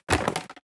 plastic, scavenger

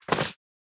paper, scavenger

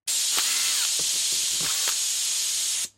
tuning payment; businesses

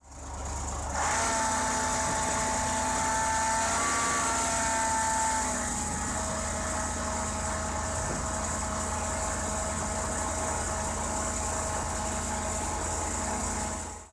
trees load

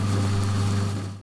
concrete mixer

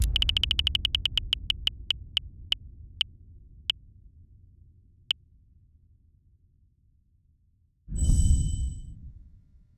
case open

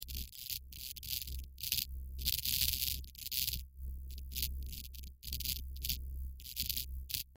background

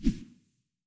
wheel open, quick menu

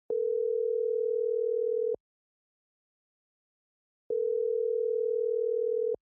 PhoneRing

